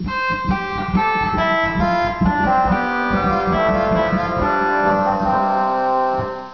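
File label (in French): Hautbois 8, organ; Martin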